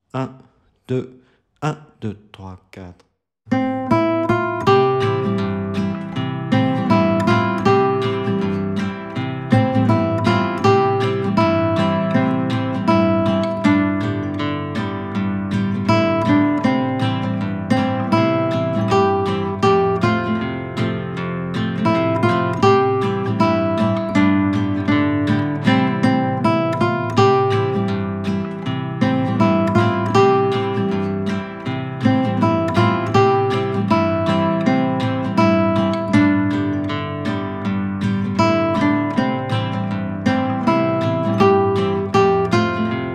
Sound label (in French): exercice 160bpm, guitare